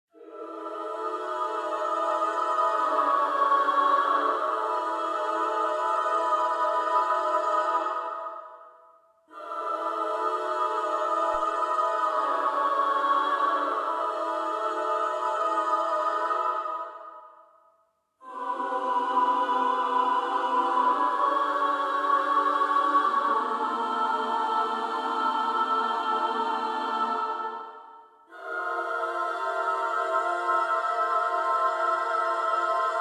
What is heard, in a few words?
church female choir; Identity V